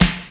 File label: original steel snare drum b key, acoustic snare sound tuned to the B note